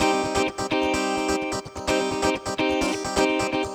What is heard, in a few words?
Electric Guitar - C min, 128BPM; Vengeance Samples; VEH3 Electric Guitar Kit 1